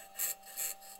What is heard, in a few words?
mic, mars2020 edlcam